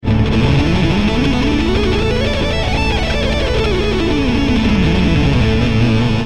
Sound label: ionian fast